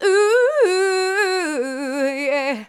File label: Female vocals and robo-vox